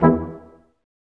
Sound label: BRS Quintet stac; Roland L-CD702